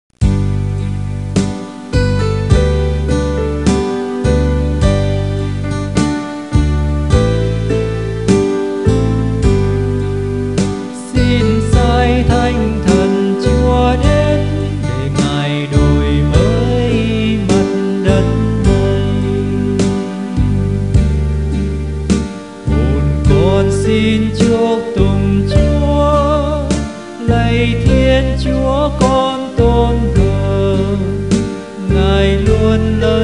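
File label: soprano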